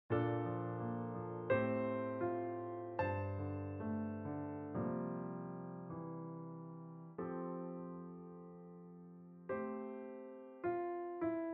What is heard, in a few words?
song piano; Little Mermaid; Ariel; Accompaniment; Disney Cruise Line